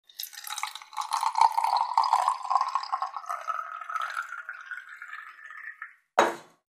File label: liquid in a flask, put on the table; water